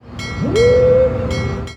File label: move order fleet; Hearts of Iron IV Death or Dishonor